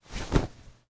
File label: clothes pickup